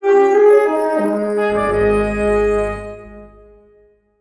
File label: FANFARE FAST AND SHORT